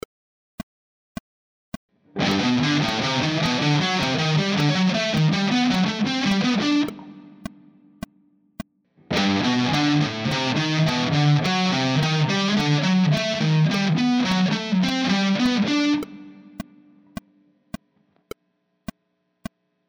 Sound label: harmonic major